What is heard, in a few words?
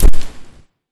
catnap footstep; zoochosis pack